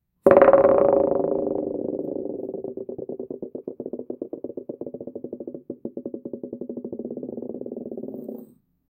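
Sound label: a smooth bowling ball rolling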